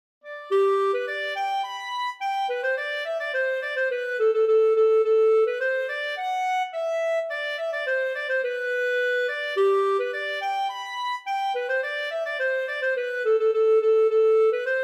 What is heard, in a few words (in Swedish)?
polka; Lycko zidens